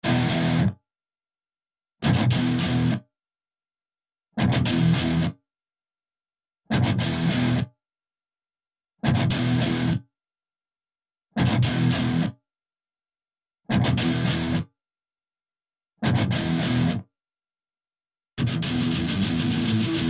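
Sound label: Guitar Riff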